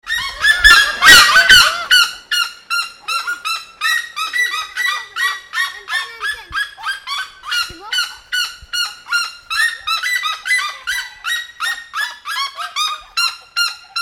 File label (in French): deux oiseaux qui se marrent; Brésil; Iguacu